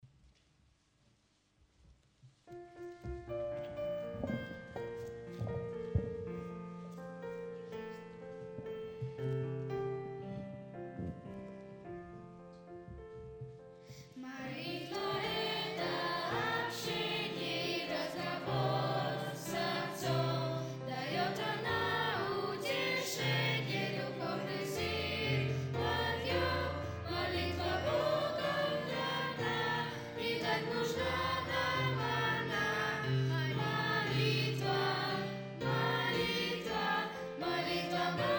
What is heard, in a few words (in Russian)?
Детский хор